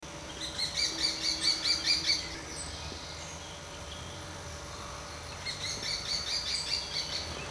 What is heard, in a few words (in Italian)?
picchio rosso minore verso eccitato